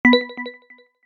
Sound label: Bluetooth connected headphones success tone; Headphones and Speakers